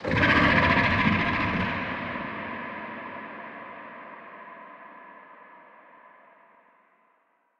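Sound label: ambience unknown creatures big snake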